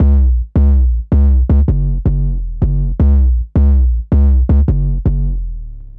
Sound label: Boom Bass, Drum & Bass; Fluid Dynamics